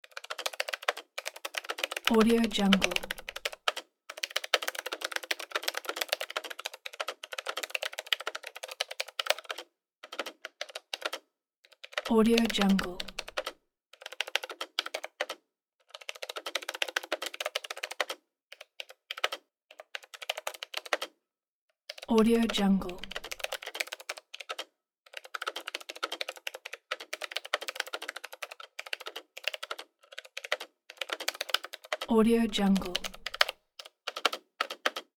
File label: keyboard fast typing; hollywoodedge